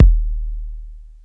big bass drum single hit d sharp key, steel kick drum tuned to the D# note